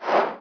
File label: menu turn start; menus; Daikatana